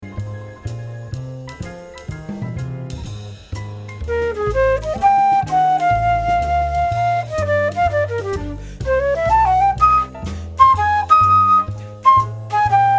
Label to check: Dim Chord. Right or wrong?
Right